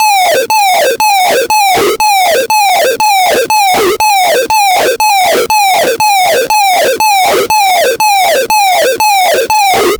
slice then thimp, weaponry

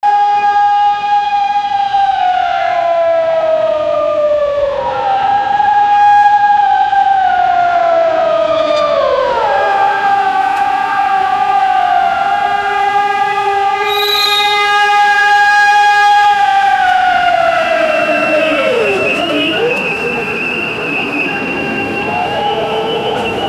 apocalypse in dubai; burj

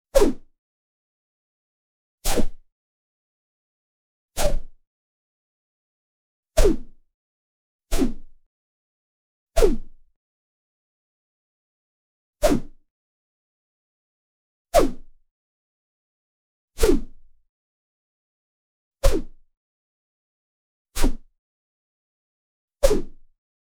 fast anime sword whoosh; motion blur

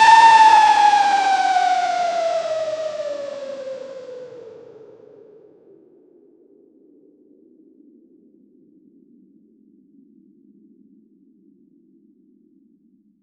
blackhole shot bow; rpg

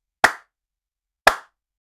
loud single clap sound

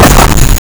a short chunky hit of, mining

